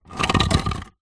concrete block small move scrape on dirty concrete